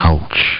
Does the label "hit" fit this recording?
Yes